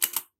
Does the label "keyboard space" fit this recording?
Yes